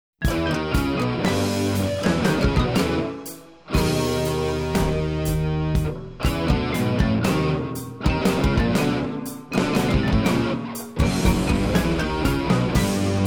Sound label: snares sample